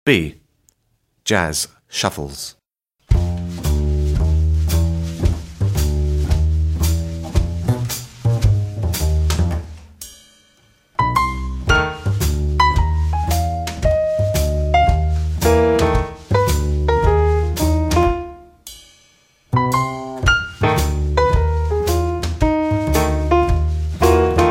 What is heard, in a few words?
Jazz Shuffles, tap; tap grade 2